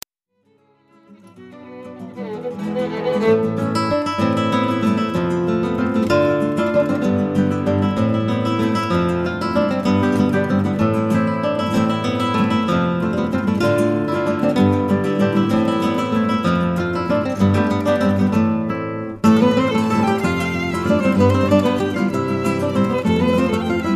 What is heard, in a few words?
waltz medley, rosewood